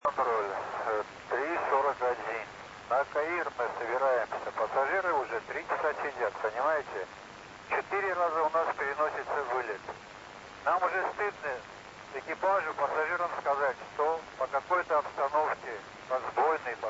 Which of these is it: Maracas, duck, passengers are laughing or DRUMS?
passengers are laughing